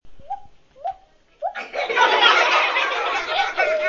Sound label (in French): bruit oiseau et rires classe